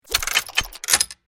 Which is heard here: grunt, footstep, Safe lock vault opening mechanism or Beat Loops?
Safe lock vault opening mechanism